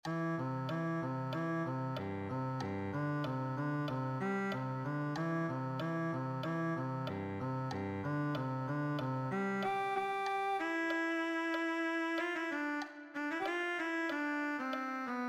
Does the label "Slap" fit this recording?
No